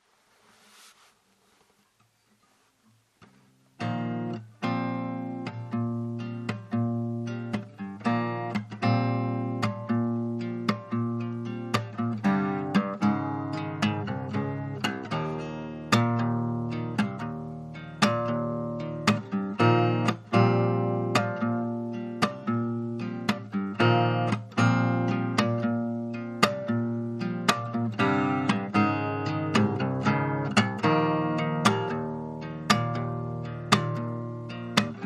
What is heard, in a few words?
Urban Groove